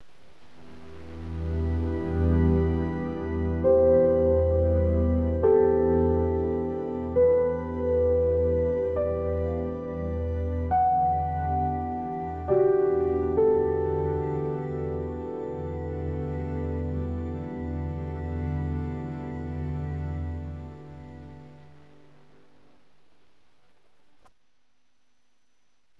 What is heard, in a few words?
drone